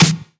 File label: Claps & Snares